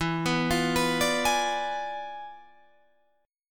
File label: E 7th Flat 9th E down Guitar Drop D